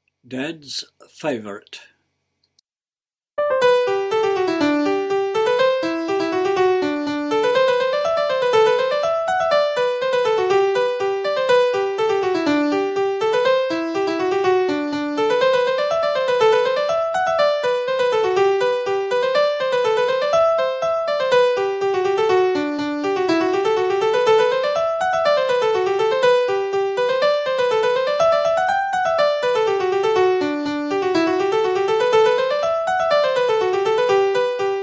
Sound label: 120 bpm